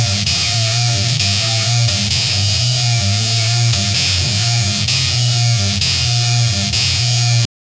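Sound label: AE86 rev limiter loop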